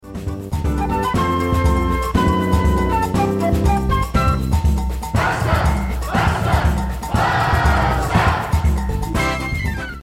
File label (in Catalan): himne samba; fcb